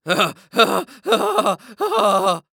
Cartoon Human Male Laugh Preadolescent; AdobeCC

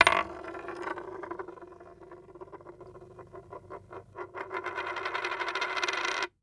Coin Quarter Spinning On Wood Table; Foley

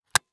Electronic Camcorder LCD Screen Closing; Panasonic Dvx100

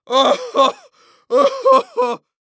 Human Male Laugh Sarcastic Out Of Breath Laugh

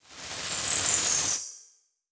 Transition Electrical Warble; Production Element Title Transition

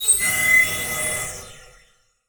Title Transition Electrical; Adobe; Production Element

